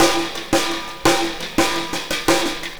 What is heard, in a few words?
Snare Particle, Processed Drumkits